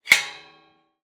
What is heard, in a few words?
Iron gate, latch, sliding, rotating, lock, clang; Eiravaein Works; Vaeyan IV